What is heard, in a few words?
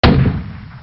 old main, modern weapons; golgotha